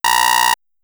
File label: beep